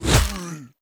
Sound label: Spit